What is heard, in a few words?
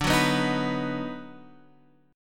Eb Minor Major 7th Sharp 5th D, chord